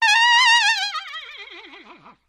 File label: Horse Whinny